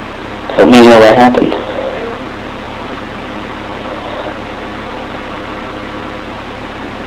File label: axe